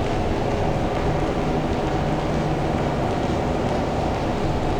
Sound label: Cracklature, sparse soundscape